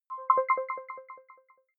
button echo resonance arpeggio; signale

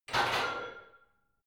barbell heavy plates replace rack reverby hard

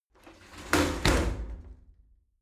shower slide door close heavy

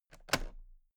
toolbox latches open heavy

